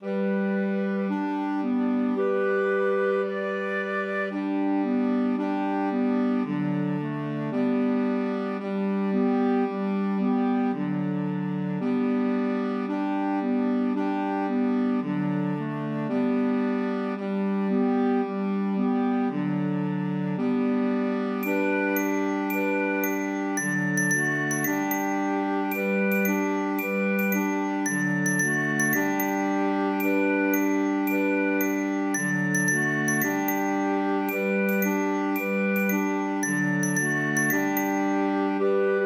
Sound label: Vendor's Song; MTWFC VOL1 Audio Accompaniments